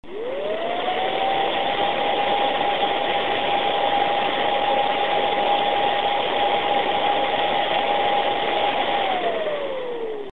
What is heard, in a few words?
volcano